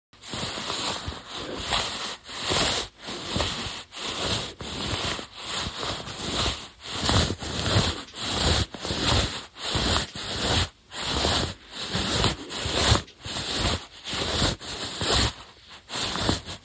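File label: rustling of a skirt while walking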